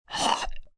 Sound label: Hork Clearing, Spitting/Horking, hork, throat clear (human voice); VoiceBox